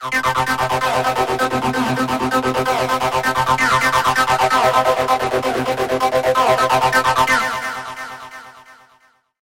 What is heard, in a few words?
Dance Arpeggio